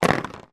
leisure board game dice shaker release dice onto plastic board; yahtzee